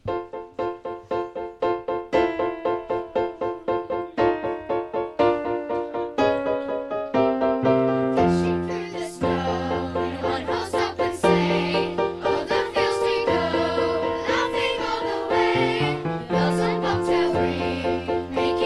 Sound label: Jingle Bells